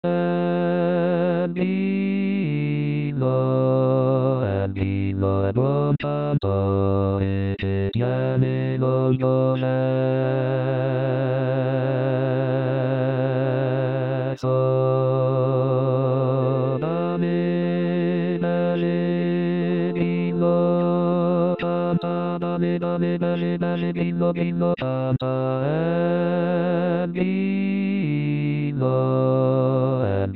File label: El Grillo Bas; bajo